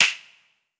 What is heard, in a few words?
Flick; blue archive